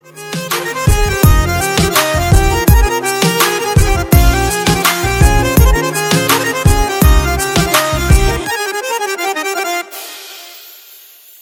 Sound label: punjabi ringtone